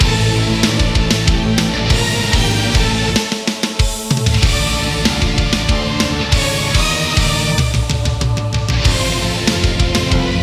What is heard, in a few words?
Loop (Smoothened Loop)